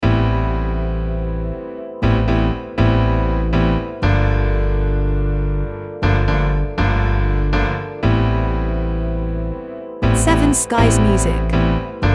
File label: Vintage Roots